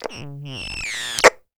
Walkie Talkie Interference; Communications

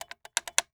Telephone Rotary Check for Dial Tone; Communications

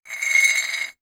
Metal Slide

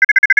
Arcade Chirp; 1001 Sound Effects

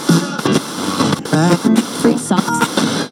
FM Radio Tune; Communications

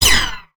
Space Gun, Sci Fi

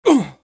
Grunts Male; Vocals & Wallas; 1001 Sound Effects

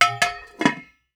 Pot Lid, Kitchen

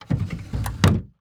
Dresser Drawer Close; Doors & Drawers

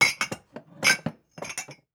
Glasses Put Away in Cupboard, Kitchen

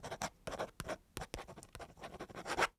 Write on Cardboard with Pen; Office Supplies

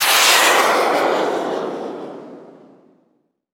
missile; Weapons; 1001 Sound Effects

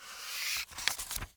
Book Page Turn; Office Supplies

